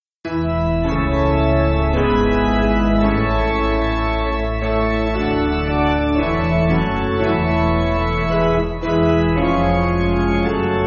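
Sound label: Piano, Music Backing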